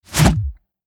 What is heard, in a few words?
close; FirefoxReality